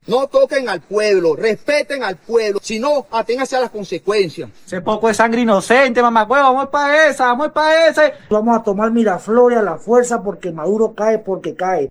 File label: vocals